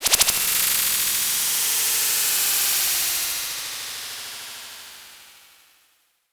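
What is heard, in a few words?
synth, Production Effects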